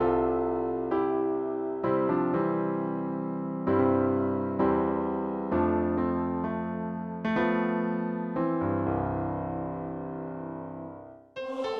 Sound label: for piano voice; rise up shepherd and follow